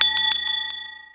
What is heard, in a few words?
hand